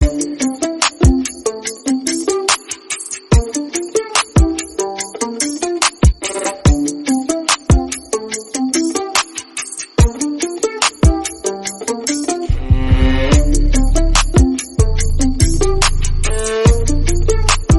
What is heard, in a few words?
instrumental